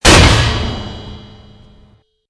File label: stomper large